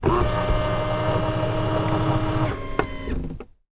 transport car peugeot 307 electric side mirrors in internal